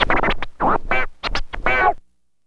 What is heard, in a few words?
GTR SCRAT R, Funky GtrLicks; E-MU Formula 4000 Series Vol. 1; Hip Hop Nation